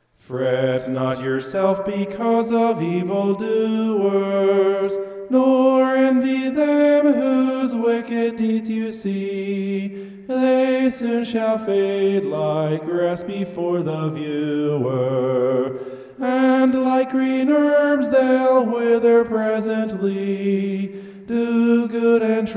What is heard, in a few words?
vocal homoph; psalm 37